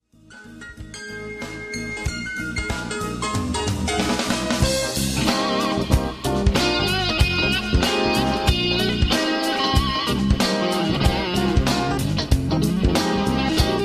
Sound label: solo